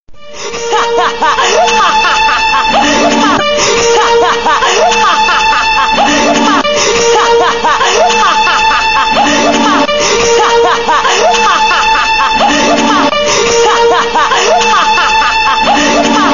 Crazy Laugh; Envy

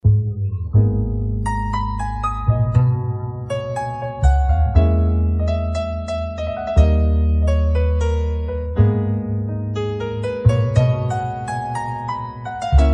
Sms Romance

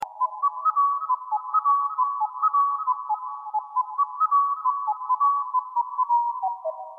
Whistle Sms Sound

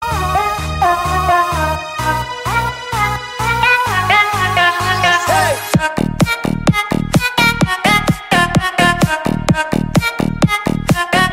new electronica

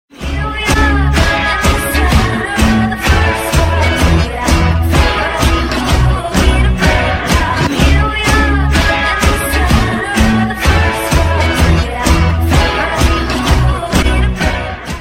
Iphone Alert Tone

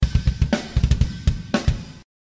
Drums

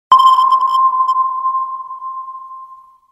Sonar Ping Even Loud

